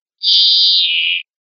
rascada, verderon

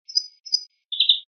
jilguerada; verderon

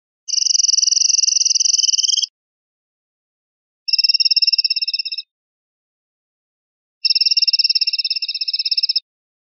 carretilla con i, pardillo

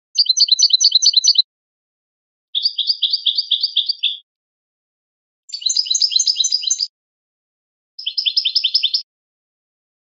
repertorio tableteos, campero

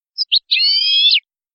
quejas comp

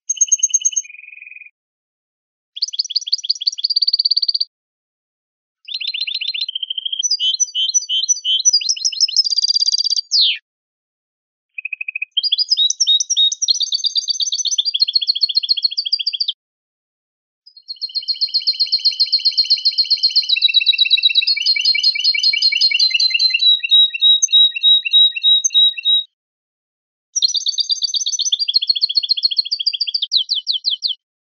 faltas acanariado

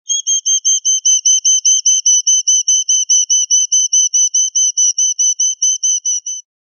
titeos

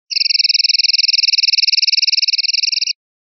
rep carretillas, pardillo

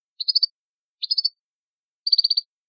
pirreos, campero

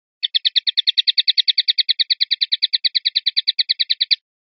rep sonajas

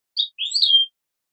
quejas chicolio

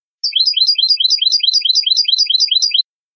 boli

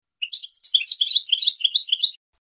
floreo tipoli tipoli; campero

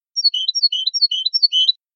mecida, campero

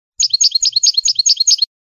tableteos, campero

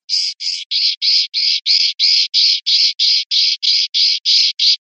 jases, pardillo

